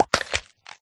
drop egg, turtle; mob; Minecraft

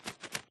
fly, parrot; Minecraft

